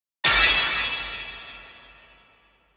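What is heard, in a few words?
Sound Effect Result Rank 2nd; Se Ingame Result Rank 2nd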